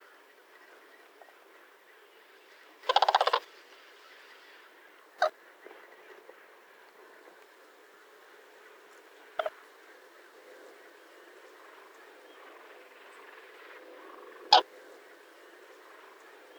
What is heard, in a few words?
call, Grus grus, Crane